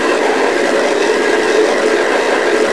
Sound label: growl, ships engine; Quantum Redshift; darius